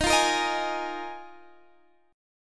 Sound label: D sharp Suspended 2nd Suspended 4th D sharp Guitar Standard; D#sus2sus4; x,18,15,15,16,16; strummed; capo=2